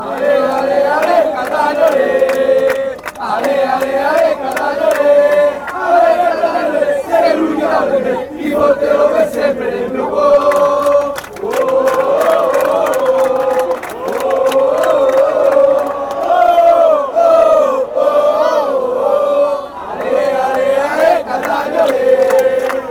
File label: ale catania ale, fanchants